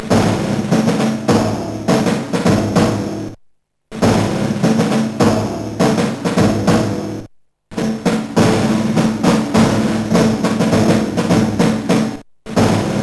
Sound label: drums; MED; Dave Gibbons; ffdrums